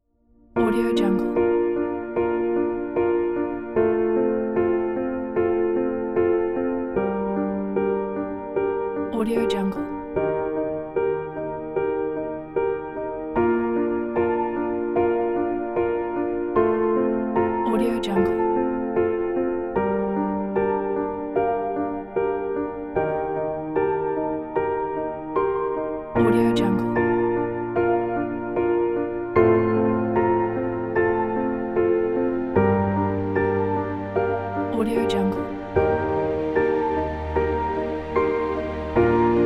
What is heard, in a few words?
piano romantic